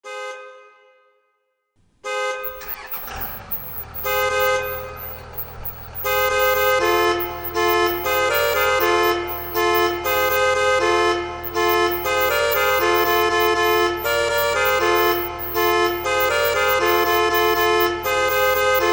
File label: car horn alarm